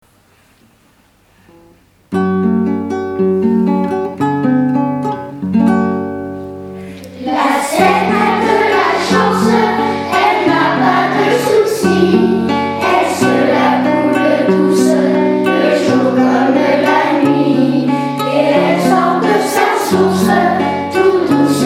Chanson de la Seine

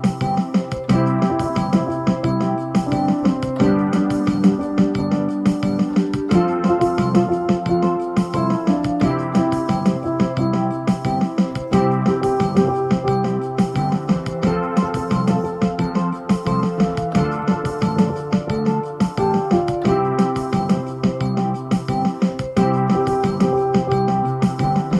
Samba à Rio instrumental

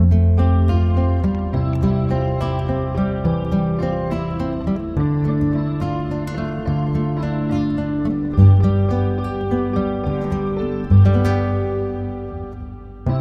extrait instrumental

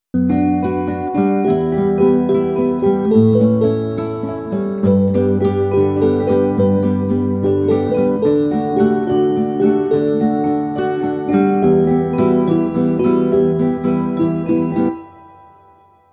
extrait instrumental

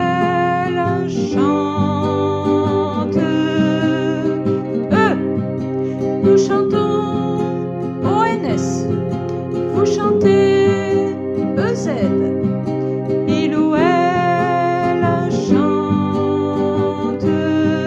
je chante la conjugaison voix

